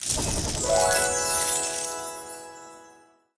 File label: medium Win, roulette